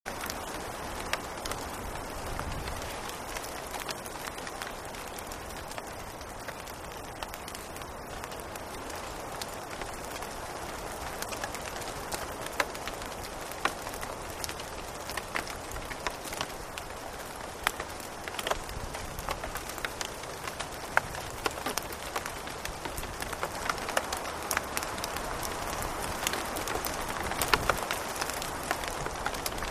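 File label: Rain Steady Rain On Leaves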